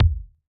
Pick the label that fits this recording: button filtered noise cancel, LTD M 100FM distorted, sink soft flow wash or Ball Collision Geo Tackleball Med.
Ball Collision Geo Tackleball Med